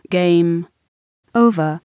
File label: Game Over